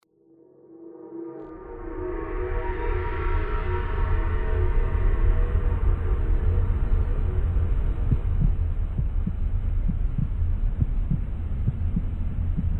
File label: Station Ambience; the white chamber original soundtrack 2006